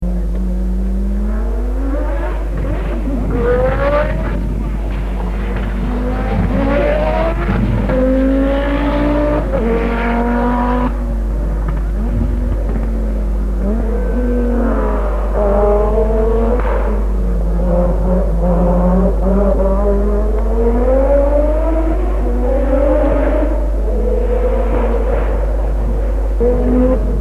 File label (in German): s1 pikes peak fahrt